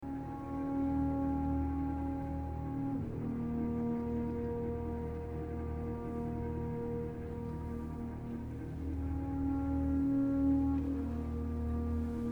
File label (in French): petale tonique